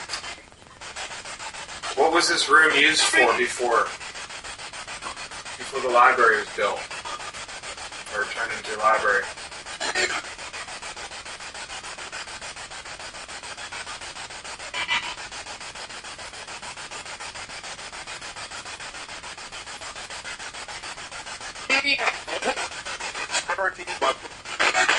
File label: Spirit Box; Attic Small Room